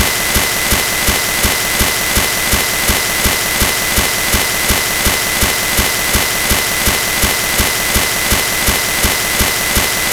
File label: Radio Noise Amplified